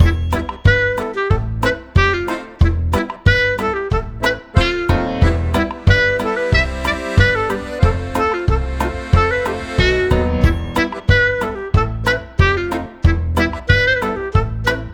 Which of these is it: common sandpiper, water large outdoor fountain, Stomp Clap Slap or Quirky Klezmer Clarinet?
Quirky Klezmer Clarinet